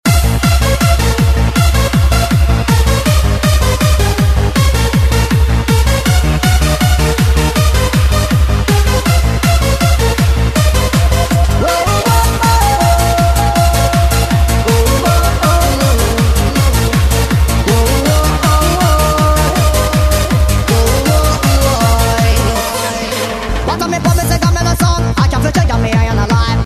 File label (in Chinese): 最新劲爆舞曲 劲爆节奏